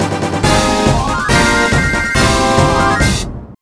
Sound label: Big Success!; Atelier Annie: Alchemists of Sera Island